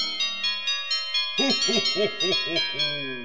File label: Empty; CHRISTMASKISS